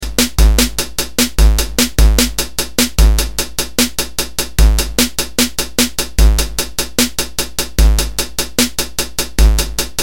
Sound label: Random Drum